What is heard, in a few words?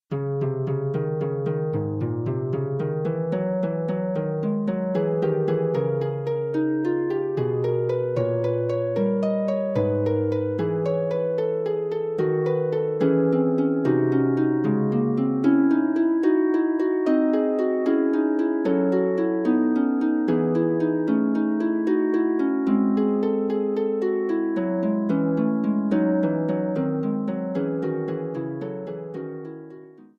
Fugue, harp; Forty Eight Preludes and Fugues Book 2; prelude and fugue no 4 in c minor book 2